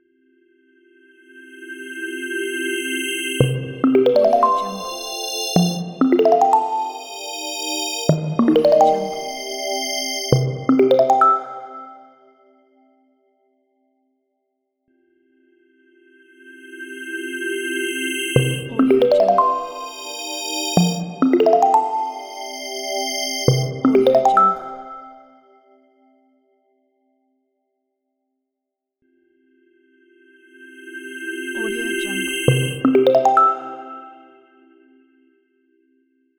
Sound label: corporate logo